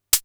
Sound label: hh, Polivoks; Formanta Radio Plant